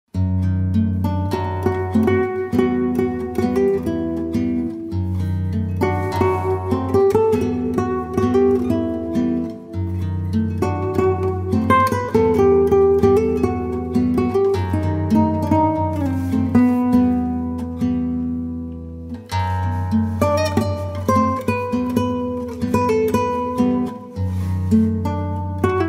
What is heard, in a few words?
Peaceful Guitar